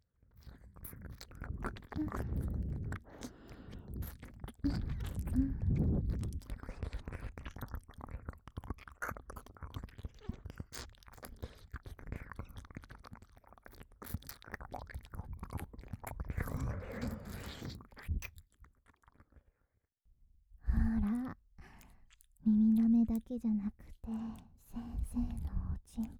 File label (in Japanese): ダブル耳舐め ノイズ除去済; 童貞先生とエッチなおしえごJK